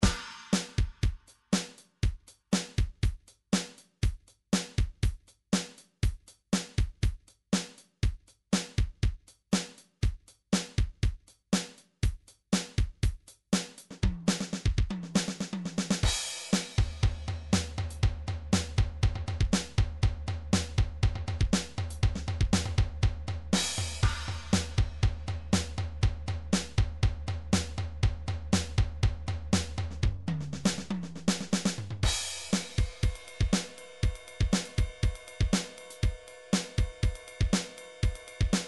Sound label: drums, midi drum patterns